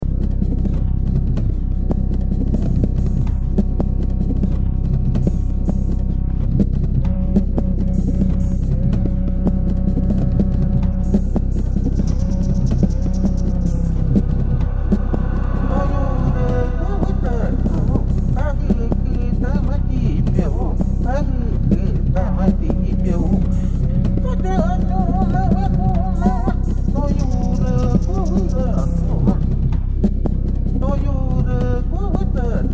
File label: drums mixed vox